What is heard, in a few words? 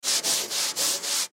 Brush Scrub Clean, Brush Scrub Clean Plastic Surface Slow; Household; Cleaning